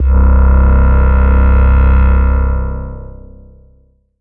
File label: Ensoniq ESQ-1 Omen Pad C2